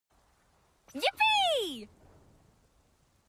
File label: yippee